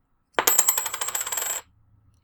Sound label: Half Crown Coin Fall